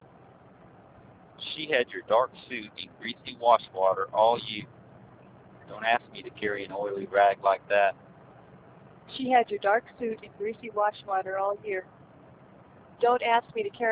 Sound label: car, noise